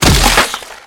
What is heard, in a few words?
Bone Breaks Blood; punches kicks hits bones